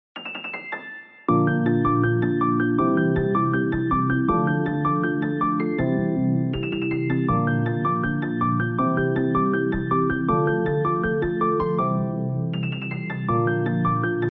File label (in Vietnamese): Nhac Chuong Piano Mix